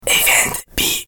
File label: JINGLE; EVENTBE